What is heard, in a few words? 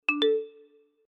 Message, notifications; Qiku; Luna Youth